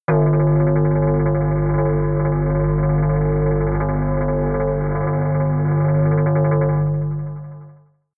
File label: synth bass rattle tremble, drones